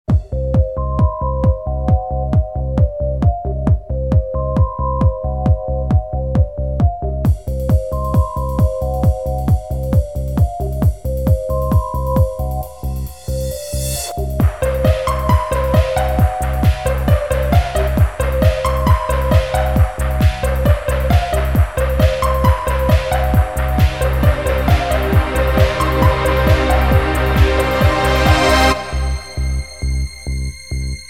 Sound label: sinister strings